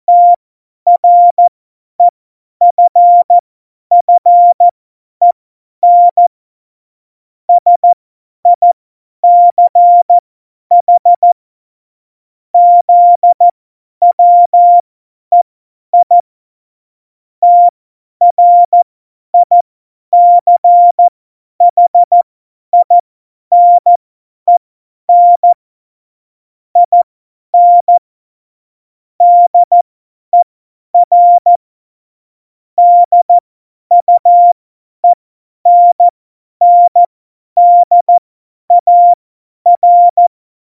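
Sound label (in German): Witz, Morsekurs, cw morsen; Tempo 50 F70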